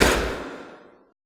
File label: CONS P, Classical Choir, CONSONANTS; Best Service; Extended Classical Choir